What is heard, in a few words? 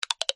Bet Down Many